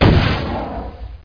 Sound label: rkt lnch